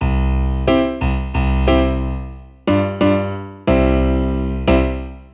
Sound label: Bright, keys